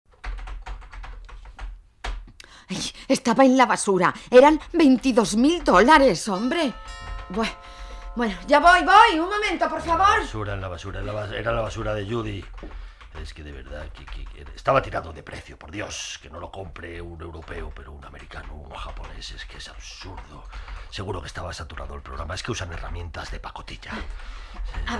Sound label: disco